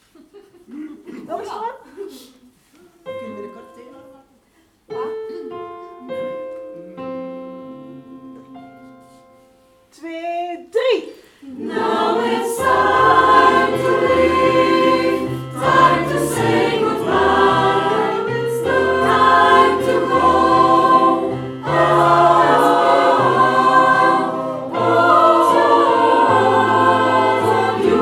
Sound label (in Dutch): SATB refrein